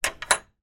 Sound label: household gate bolt movement